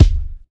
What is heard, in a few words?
kickdrum sample g key; kick drum samples